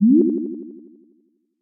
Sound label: move